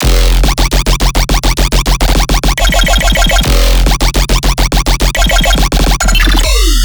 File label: Riddim Bass Loop F 140; Heating Up; Ghosthack; Riddim Essentials Volume 2